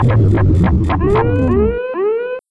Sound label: nuclear end